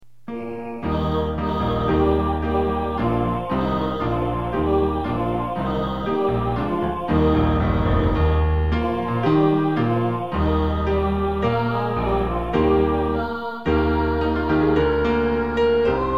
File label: E flat to F Major